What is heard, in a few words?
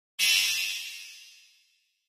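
HF Electronic Spring Reverb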